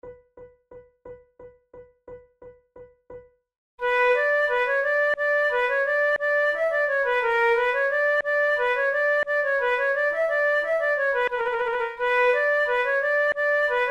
for two flutes; Monteclair; 6 concerts; le moucheron; flute tunes

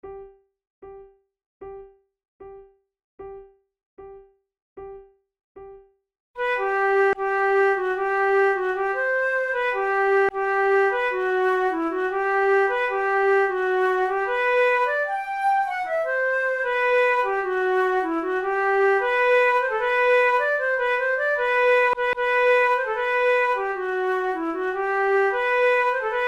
duet

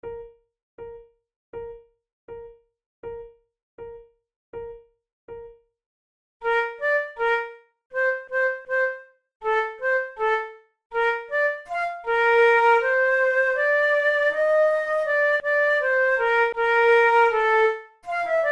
pour la flute duos allegro

